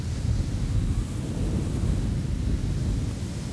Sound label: dry wind; environment; Tribes2